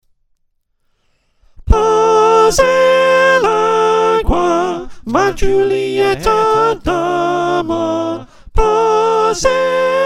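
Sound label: Soprano, choir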